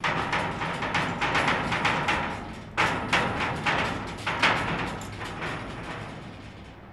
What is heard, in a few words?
Jail Door Rattle; jail & prison doors